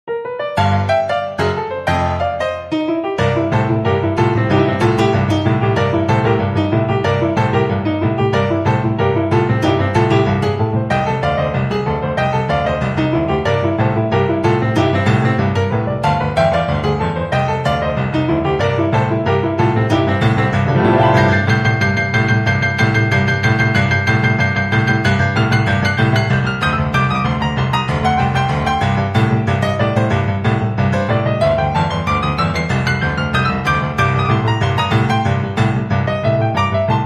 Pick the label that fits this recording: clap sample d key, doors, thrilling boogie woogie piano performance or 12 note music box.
thrilling boogie woogie piano performance